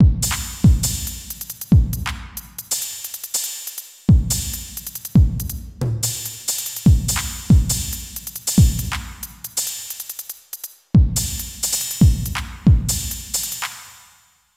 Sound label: simple drum replacement